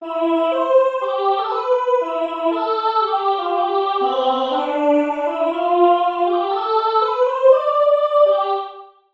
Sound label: russian anthem